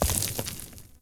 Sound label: Horse Surface Kickup Dirt; Warframe; Duviri Horse; Vehicles